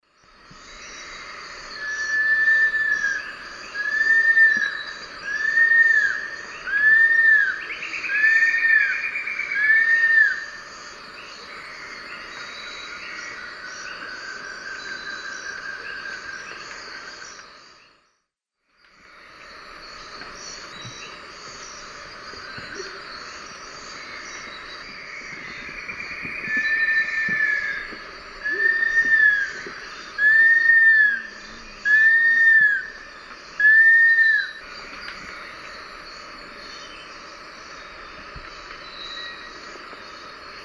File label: Nasica longirostris, song; S. America; Eastern Lowlands